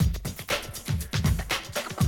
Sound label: 120, Classic Drum Loops